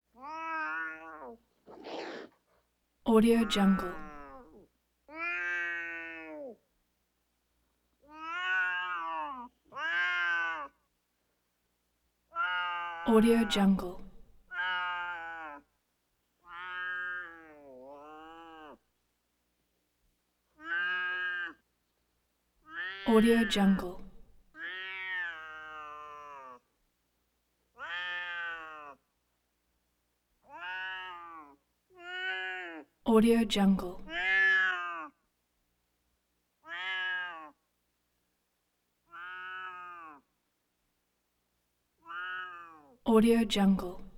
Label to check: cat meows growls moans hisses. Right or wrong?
Right